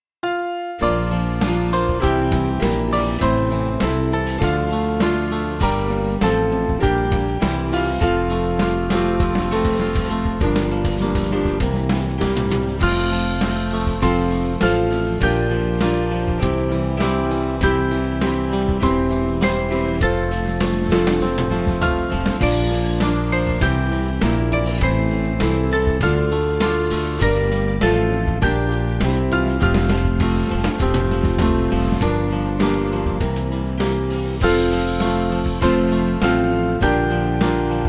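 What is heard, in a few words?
Music Backing Files for Churches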